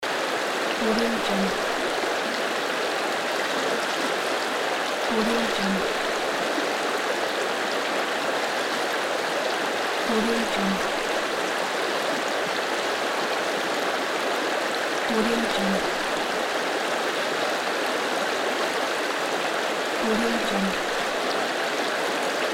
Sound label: mountain river flowing